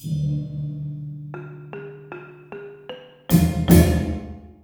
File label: MALAY PHR L, Heart of Asia; Spectrasonics - Heart of Asia CD2